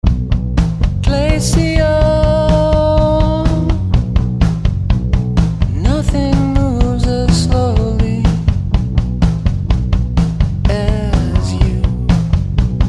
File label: Bass Vs. Treble